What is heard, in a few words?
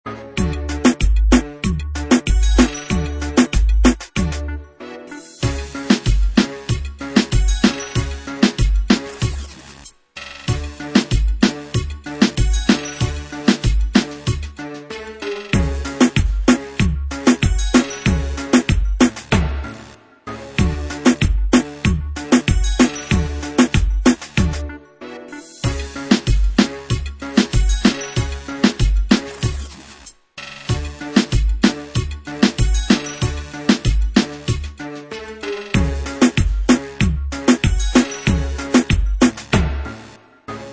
Reggaeton Beat